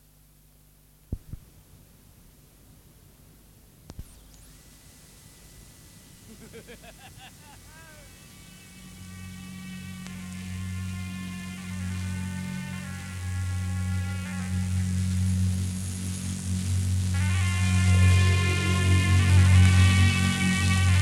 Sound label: summer noise